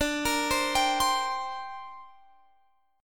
D 7th Suspended 4th Sharp 5th, down, Guitar, Drop D